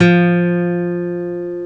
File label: Acoustic Guitar F3; scratch